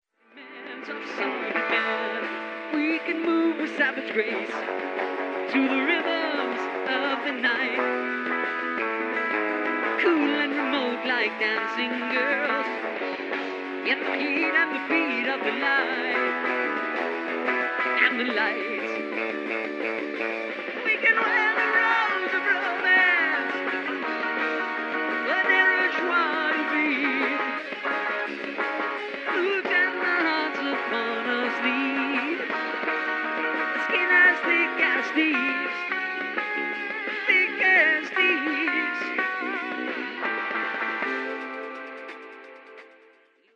acoustic

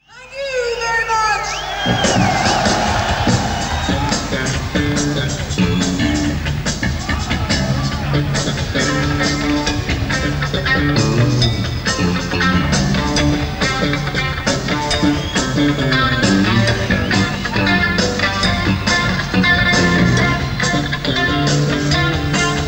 Dance Groove

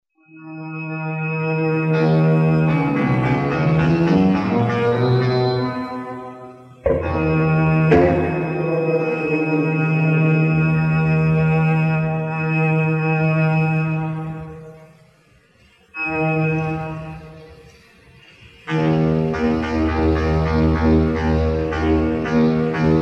Synth Soundcheck

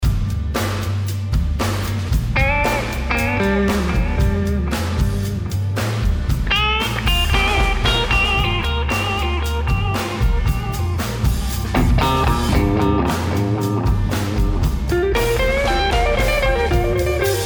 bravest guitar

